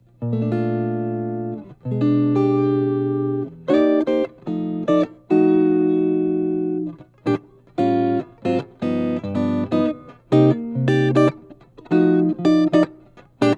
Jazzy Tele Neck PU; eminence 820h; Jazzy Tele using neck pickup; Billy Penn

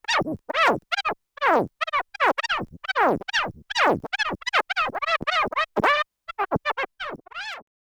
club, dj scratch

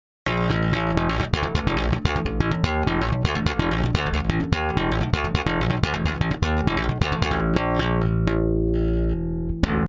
multi comp, effector bass

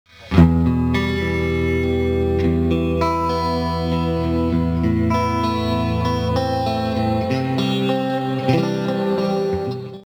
electro harmonix holy grail, guitar effector; guitar effector variation

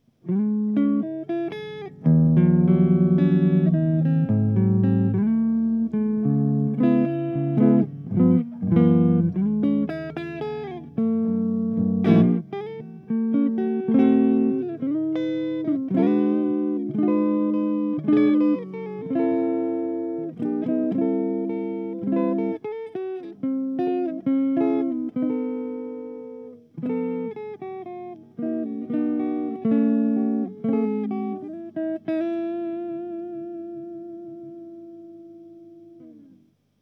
jazz, eq

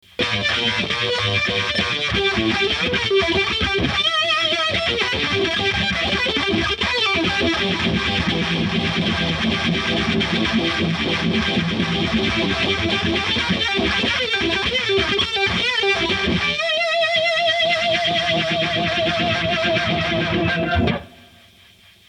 digitech exp, guitar effector; guitar effector variation

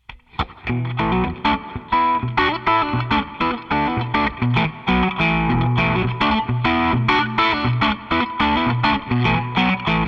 legend em12n strat; eminence; Mayer-ish Strat

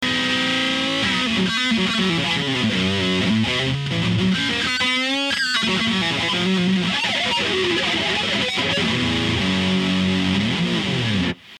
Boss MD2, effector

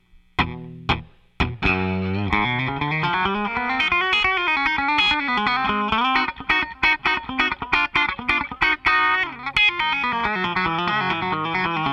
legend em12n country, eminence

